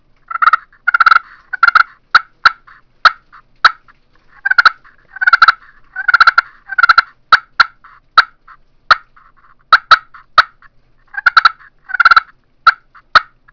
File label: pro glass clucks purrs, turkey; Southland Sounds